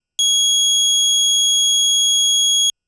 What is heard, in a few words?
Pulse A G#6, keys; fleet keys Samples